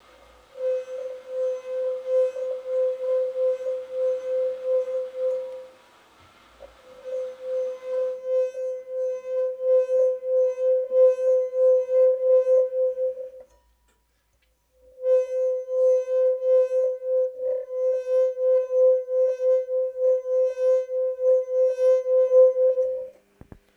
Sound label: wine glass overtones; hamburg